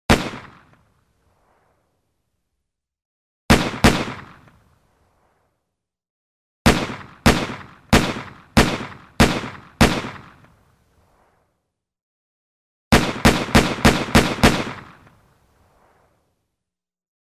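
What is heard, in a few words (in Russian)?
medlennye vystrely pistoleta, slow pistol shots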